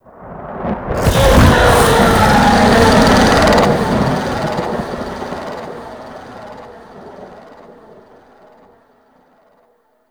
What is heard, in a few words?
Infested Micro Planet Moon Monster Bellow; Warframe; Microplanet Sun Monster